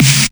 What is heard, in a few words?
SD, korg drums